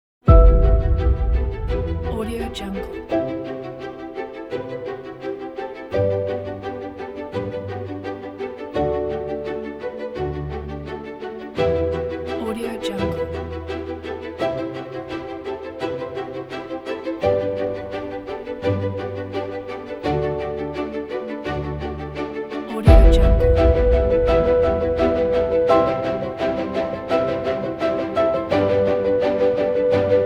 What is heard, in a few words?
motivational and epic cinematic orchestra; music2noise